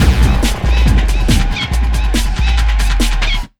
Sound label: 140, Big Beat Drumloops